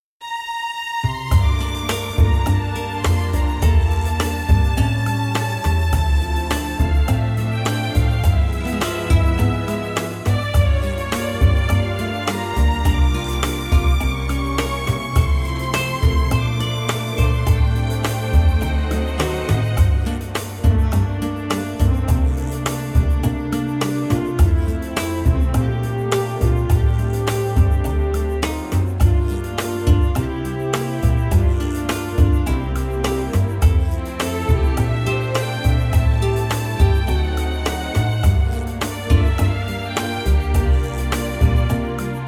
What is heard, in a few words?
Rhumba